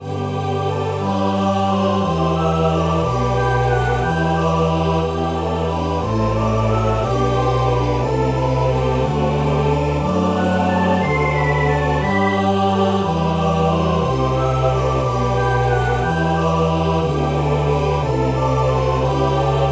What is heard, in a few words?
echo drops